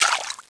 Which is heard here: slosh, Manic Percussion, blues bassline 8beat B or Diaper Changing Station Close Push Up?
slosh